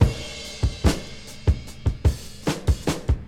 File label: 73 bpm hq breakbeat sample b key, Free drum groove - kick tuned to the B note